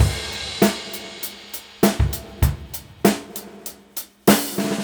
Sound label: drum break 99bpm